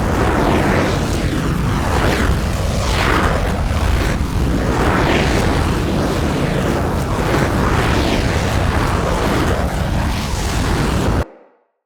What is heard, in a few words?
Tendril Loop; Eximus; Arson